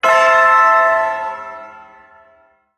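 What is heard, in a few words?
Shawzin Pentatonic Major Chord; Warframe; Duviri Ersatz Shawzin; Chords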